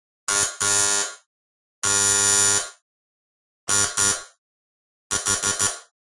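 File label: Door Buzzer Household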